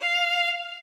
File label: solo